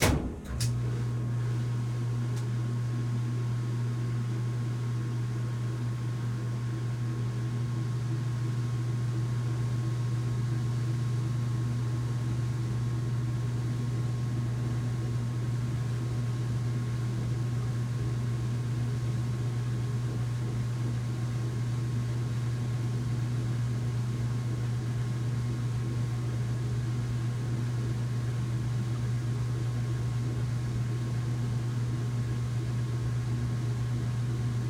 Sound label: Washing Machine, Inglis, Citation, Wash, Lulling; Household